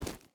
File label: footstep rubber boots gravel run; Rust